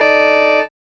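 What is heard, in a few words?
car horn; E-Lab; X Static Goldmine 1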